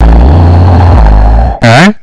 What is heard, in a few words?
noise rock